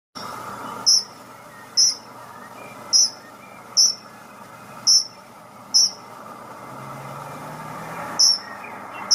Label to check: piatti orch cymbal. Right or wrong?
Wrong